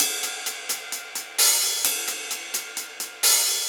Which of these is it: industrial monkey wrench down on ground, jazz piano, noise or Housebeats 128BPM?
Housebeats 128BPM